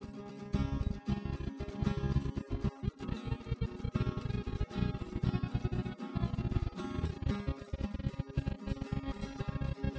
no drums inst; SASSEC07

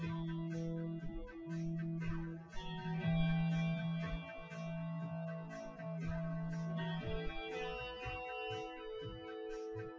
w drums synth conv 250ms 5cm sim; SiSEC08; SiSEC underdetermined

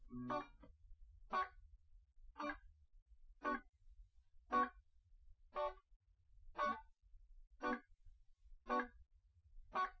nodrums liverec

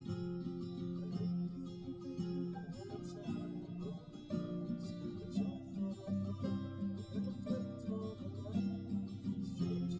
nodrums liverec 1m; SASSEC07

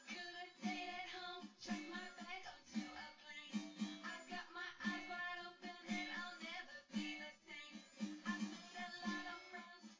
wdrums synthconv; SiSEC10; underdetermined

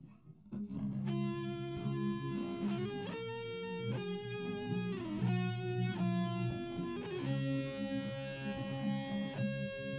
nodrums inst sim

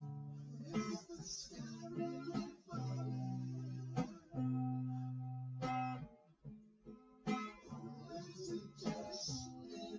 test nodrums synthconv 250ms 1m src; SiSEC08; SiSEC underdetermined

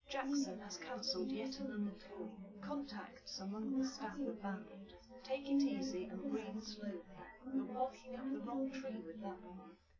female live rec 5cm sim; SASSEC07

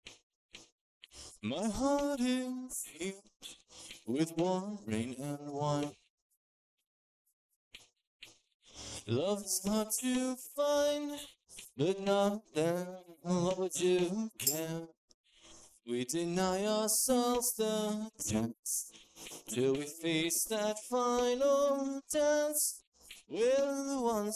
vocals; SiSEC11

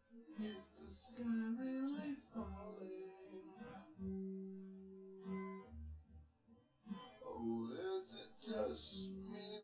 no drums, synth conv, 250ms, 5cm; SiSEC10; underdetermined

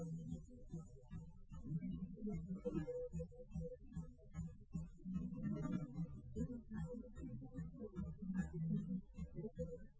wdrums liverec 250ms 5cm sim

